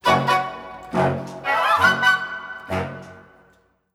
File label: Stinger; Pandaria